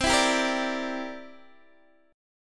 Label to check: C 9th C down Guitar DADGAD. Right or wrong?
Right